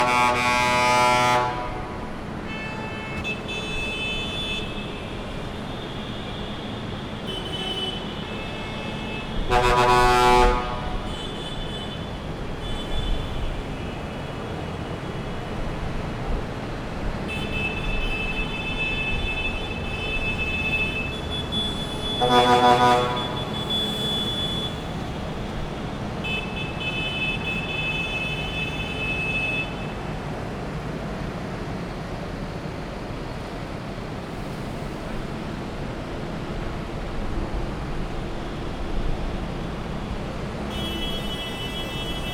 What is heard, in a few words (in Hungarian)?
traktoros tüntetés